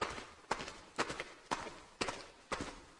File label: footsteps ghoul; Be Cool Scooby-Doo! - It's Dark Out There; ThrillGames